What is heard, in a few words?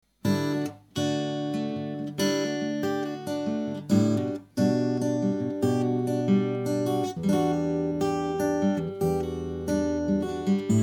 fingerstyle